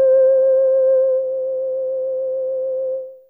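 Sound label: Whistle C3, Humans; Humans1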